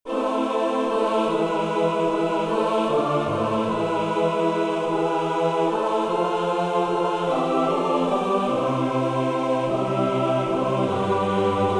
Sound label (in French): Psaume version SATB; Alleluia final PS150 Schütz; Messe Soli Deo Gloria; Acclamation